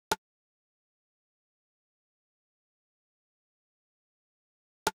Windy Drum Loop - 101 BPM Percussion; Loop Stems; Cymatics; Vibes II Drum Loop Collection

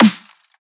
Dubstep Snare; Ghosthack; Dubstep Sound Arsenal

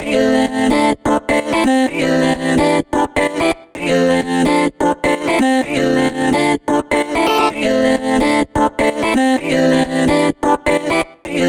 House Vocoder Loop 128 BPM A Min; Vocals, Chop Loops; Cymatics

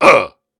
Grunts and Chants, Viking Vocal

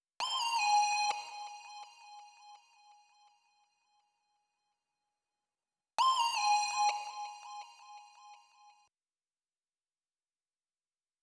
166 BPM G# Maj Lead, melody loop stem; Loop Stems; Cymatics; 2022 Melody Collection - Wavy; Foreign

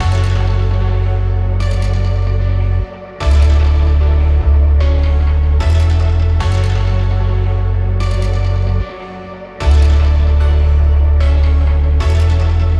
Kit Full F#m 150, Trap; Gone

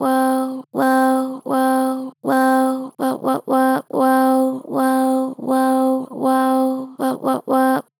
Gen Flat Woah Wo, Vocals; Harmor Vocals

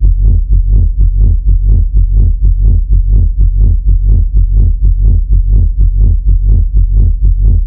Bassline 125 F; DDT; Chronic

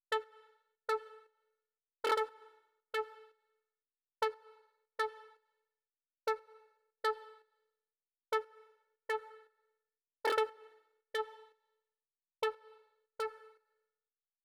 117 BPM A Min Lead, Sunset; Melodies; Loop Stems; Cymatics; GHOST (Beta Pack); Blizzard

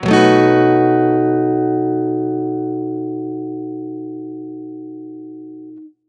Guitar B7maj; One-Shots; Ghosthack; Guitar Loops & Shots

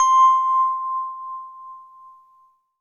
Jupiter-6 BELL Vibes High C; Synth; One Shots; Cymatics; Fantasy Synth Sample Pack

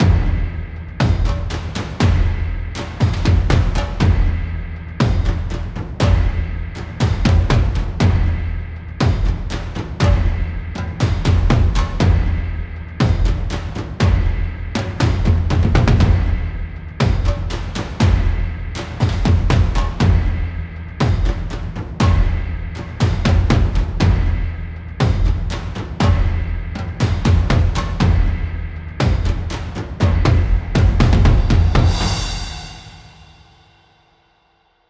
Percussion - 120BPM; Construction Kits; STEMS; Ghosthack; SkyRealm; Kit 11 - Warriors Cry

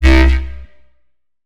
Bass Shot, dubstep and trap; Ghosthack; Dubstep and Trap Pack 7

